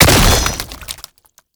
Strike Leap Hit; Boss; Enemies; Abilities; Warframe; Oraxia

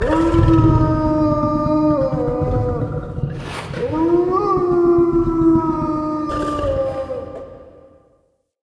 Wolf Sad Howl; Animals; Perception

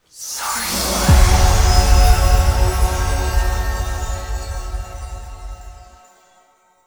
Spawn Stinger; Warframe; Jade Shadows

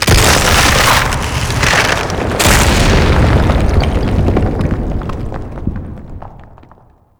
Mountain Pass Snow Crack; Ambience; Warframe; The War Within

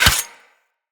Melee Dagger Impact Flesh

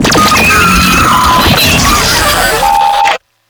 Idle, Vocals; Enemies; Warframe; Proto Infested; Brachiolyst

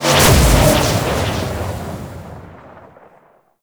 Void Dragon Orb Cast; Enemies; Abilities; Warframe; Duviri

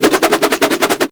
nunchaku quick spin whoosh; Warframe; Tenno

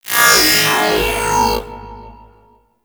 Minigame Fail; Corpus

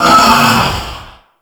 Obstacle Course Falling Tile; Warframe; Ambience; Clan Dojo

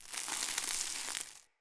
alley close crumble; Ambience; Under City

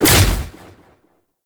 Shield Knock Down; Warframe; Warframes; Garuda

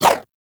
Infested Throwing Star Whoosh; Weapons

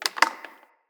Tree Pine Branch Break; Midrath Island; Ambience

